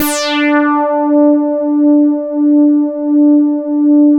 OBERSYN D5

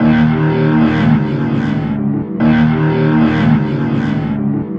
Processed Rock Guitar; Sonic Foundry (Sony Creative Software); Loop Noir; Session 08 - Psychic Investigator

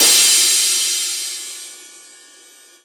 Crash, Cymbals

